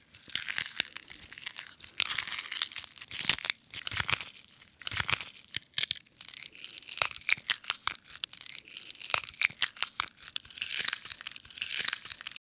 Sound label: Breaking Bones